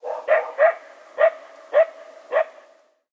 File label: Ambience Menu Dog Barking; subway surfers city sound effects; Android, iOS